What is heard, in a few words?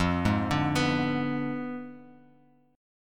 F Diminished 7th F down Bass Standard; Fdim7 arpeggiated; JGuitar